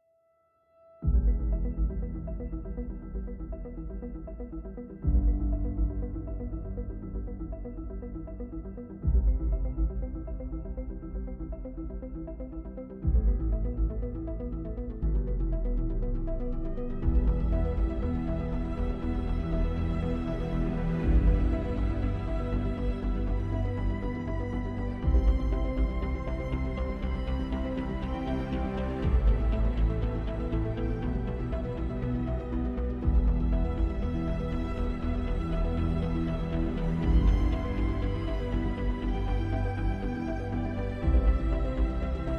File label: Hybrid Sci Fi Cinematic Technological